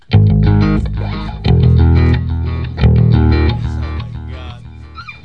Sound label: Very Short Sms